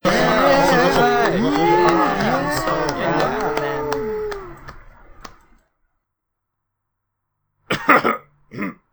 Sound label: Ponies Cheering